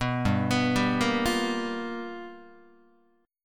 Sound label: G 7th Sharp 9th G chord, Guitar; G7#9 arpeggiated; JGuitar